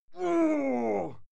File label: male fight scream pain muted; soundpool